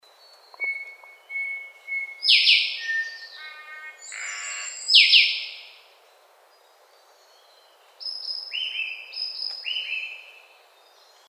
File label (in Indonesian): suara burung cissa thalassina ekek keling